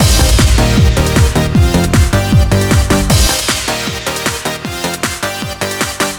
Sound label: lowcut